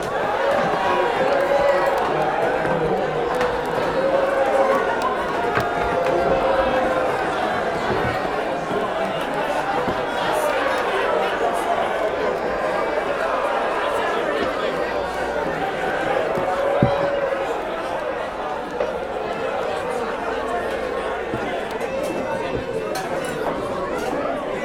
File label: Tavern Ambience; gamerip; The Great Whale Road